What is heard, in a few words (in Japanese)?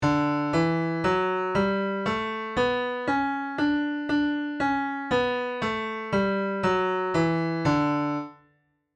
Dメジャースケール